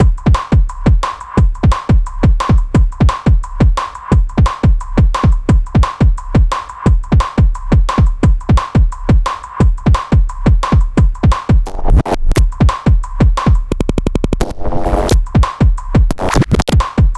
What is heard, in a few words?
drums